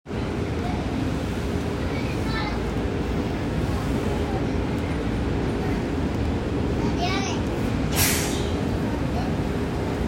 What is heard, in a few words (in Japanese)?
西武池袋線小手指西, train sounds